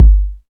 steel kick drum g key

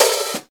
open hat b key

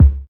warm sounding kick drum one shot c sharp key

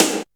present acoustic snare d key; Duration 0:01; snare drum samples

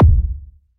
old school phat bass drum single shot c key

